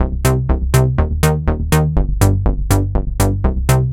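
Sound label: Synth, 122bpm, Nu Dance Electro; Zero-G; Soundclash; Acidized Wavs; Combi Loops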